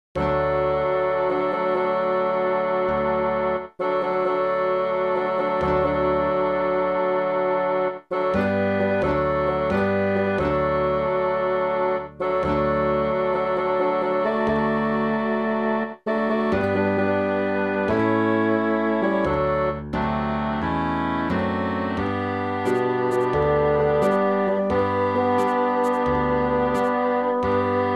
midi

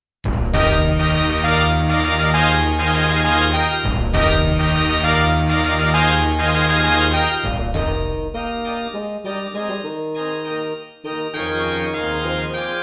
midi

